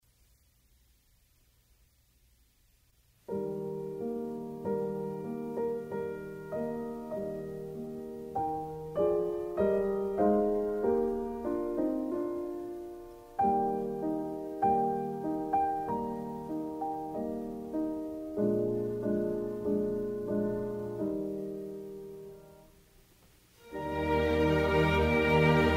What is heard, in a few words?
piano concerto Larghetto